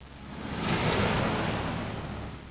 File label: car, transpo cars